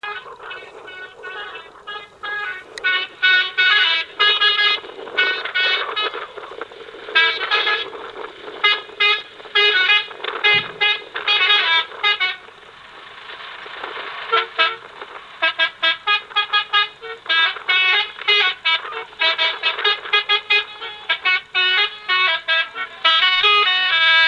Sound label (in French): klaxon bus